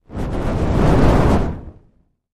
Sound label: Fire Ball